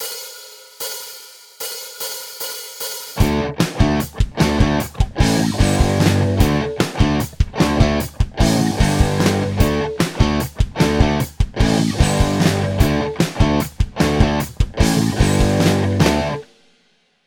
Power Chord, guitar

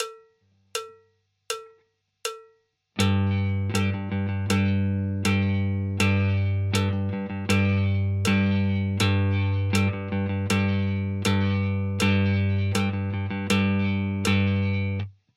rhythm, guitar

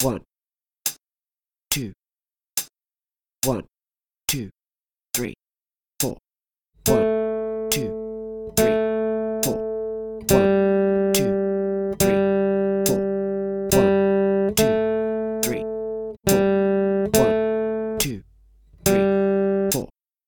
G string, guitar

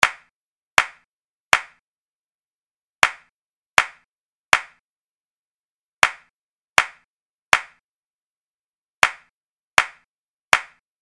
clapping

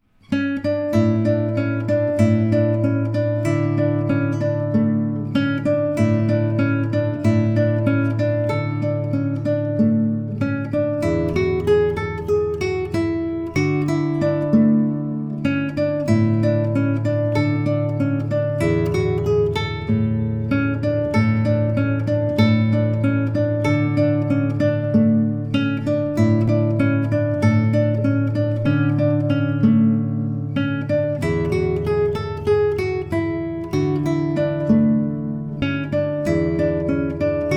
Fingerstyle Guitar; Fingerstyle Guitar V2

